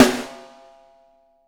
6X12 WOOD; Sampleheads; Peter Erskine Living Drums

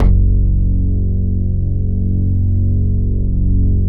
PUNCH F#2; Masterbits - Tekkno Therapy

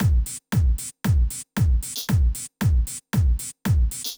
Drumloop 115bpm, Nu Disco; Zero-G

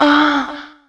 vox ahh; E Lab; XTc Files of House

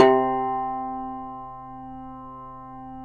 YAYLI TAMBUR C; Spectrasonics; Hans Zimmer Guitars VOL-2

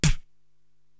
SD Pop Vox, Korg TR-Rack drum machine

